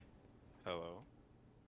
wolf hi

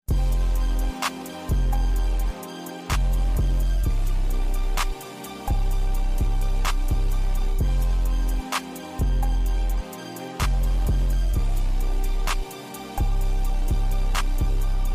instrument

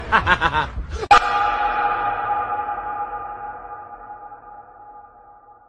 hahaha aaaaa

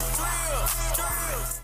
drills drills

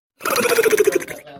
seizure

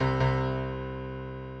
removed from charging

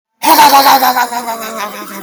hawk hawk hawk; loons

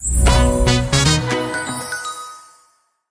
win sound; blocksworld

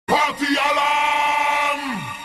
party alaaaarm

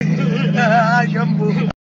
hehe; shampoo

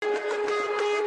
siren cut

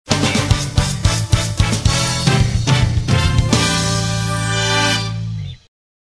level clear

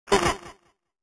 laughs

